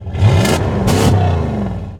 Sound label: Truck Durango Rev; Trucks